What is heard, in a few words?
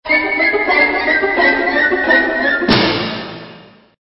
Stinger; STINGERS